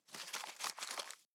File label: Crumpled Paper